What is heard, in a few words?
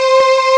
Strings, Amiga Soundtracker; ST-XX Amiga Soundtracker Sample Packs